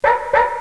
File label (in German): wau wau